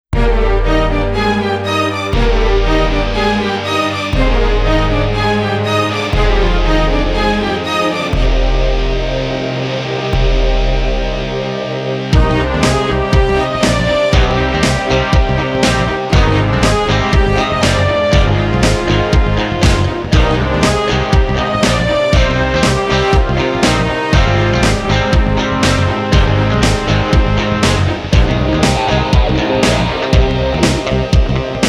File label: Sports Dramatic Epic Orchestral Anthem